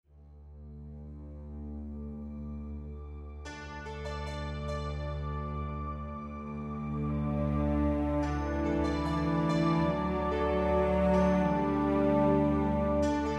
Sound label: Morning Dawn strings and dulcimer